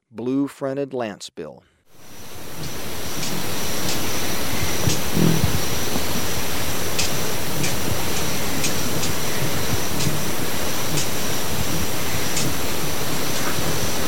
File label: Blue-fronted Lancebill; S. America